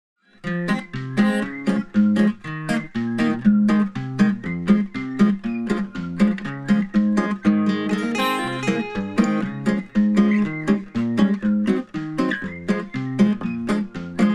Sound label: guitars, 120BPM